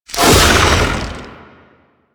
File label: Flame Coil Ability Shot C; Warframe; The New War; Archon; Amar; Abilities